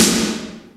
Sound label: Snaredrum, Roland D-70; Drum Samples